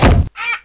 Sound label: Bug smash